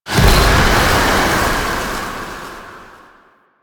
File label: Void Dragon Firebreath Cast Poison; Enemies; Abilities; Warframe; Duviri